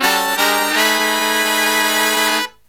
Killer Brass Riffs

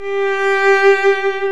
VIOL G3; Roland - LA Composer vol. 1

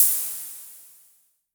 Hi Hats Open; LIMAT Div Dept

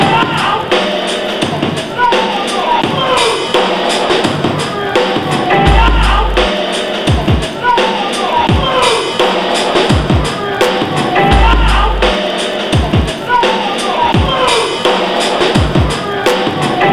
85 bpm; 90 s Sample CDs; Planet of the Breaks

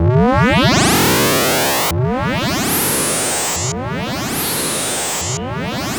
GROWL; Zero-G Ambient 1; EXTREMESTUFF

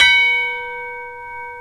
CHIME; Kid Nepro; Elite

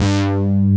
analog stab; Pro-Rec - Synthsonic; TRINITY

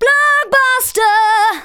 VOCALS SUNG; Techno Trance Essentials

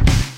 Snare, Yamaha PTX8

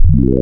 Additiv Mix, wavetables; Massive 2-Basic

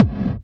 hard bass drumz

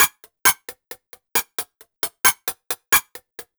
133 Ragga & Dub Reggae HH; USB Soundscan vol.27; AKAI

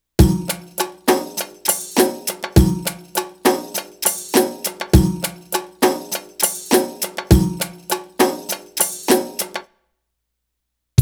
Arabic Rhythms

